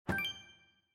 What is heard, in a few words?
offer accepted